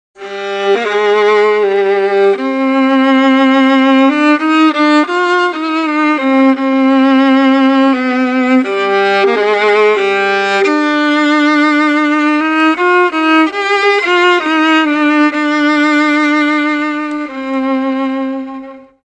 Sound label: viola